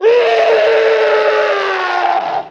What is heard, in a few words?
scream